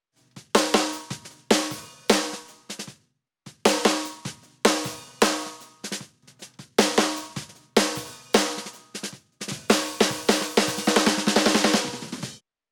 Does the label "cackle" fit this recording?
No